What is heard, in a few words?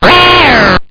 joust alarm